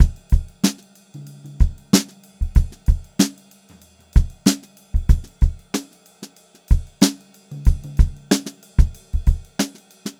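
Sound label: STRAIGHT VARIATION, Funk-Groove Drumloops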